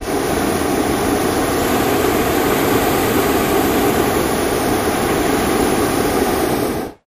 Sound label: Hot Air Balloon Burner, Medium Close, Very Long